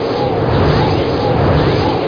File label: tornado; Hexen II; crusader